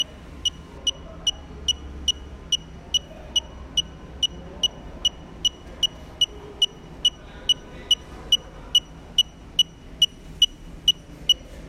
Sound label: Recording Door Alarm